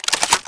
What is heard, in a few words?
shotgun reload; weapons